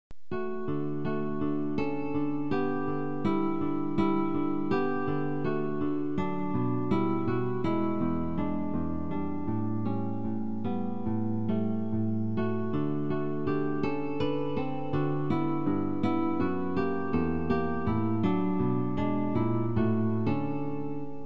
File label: variations guitar